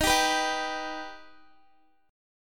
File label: D sharp Augmented D sharp Guitar; D#+ strummed; x,18,19,16,19,x